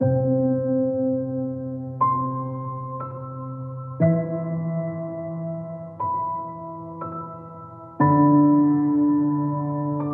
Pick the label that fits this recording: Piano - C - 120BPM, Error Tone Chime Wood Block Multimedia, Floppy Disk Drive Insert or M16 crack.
Piano - C - 120BPM